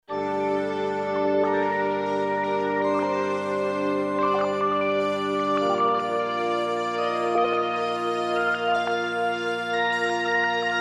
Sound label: organ harmonium, yamaha sy55